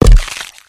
footsteps, run

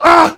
heavy pain sharp

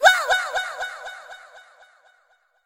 lose; freak fortress 2; hat kid

